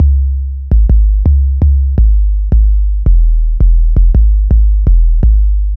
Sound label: 808 Standard, Bass Lines 166.5; Jungle Warfare 1&2